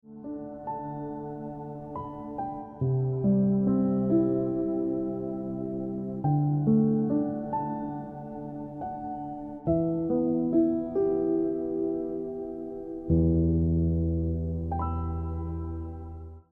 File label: Piano Music